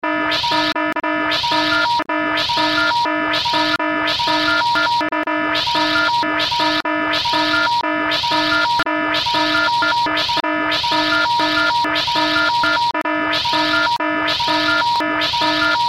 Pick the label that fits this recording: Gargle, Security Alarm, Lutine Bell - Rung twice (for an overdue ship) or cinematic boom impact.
Security Alarm